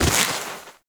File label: Step Snow